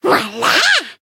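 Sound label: makiwara attack, Japanese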